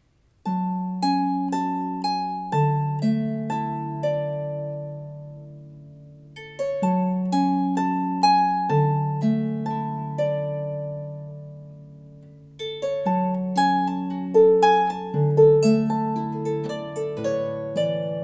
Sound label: harp; solo